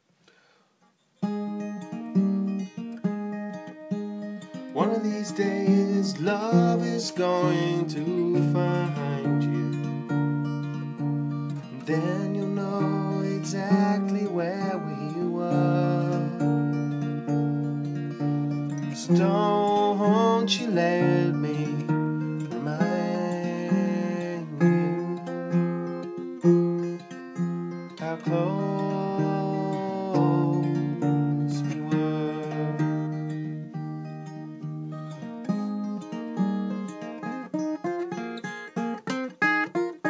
picking song idea

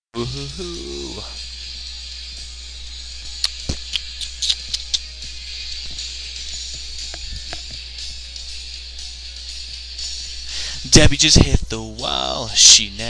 mirin my vocals

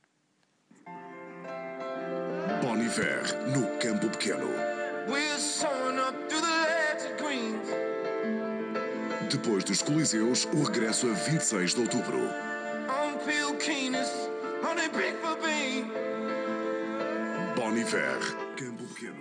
singing; What are they SINGING?

